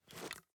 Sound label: putaway; tacz; daffas arsenal